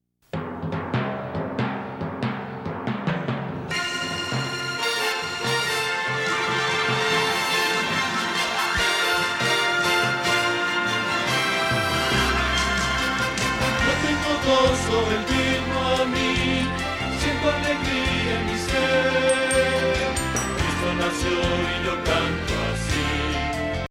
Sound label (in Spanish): Bajo